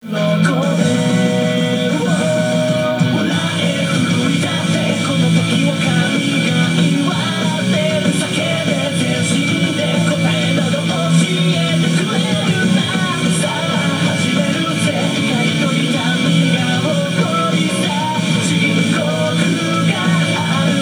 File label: bgm inside karaoke; yakuza ryu ga gotoku kiwami 2 pc gamerip